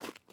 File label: ice step; teardown; ps5 windows xbox series xs